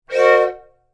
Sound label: Whistle Steam, Transportation, Train, Whistle, Vintage, Steam, Single; Trains; BlastWave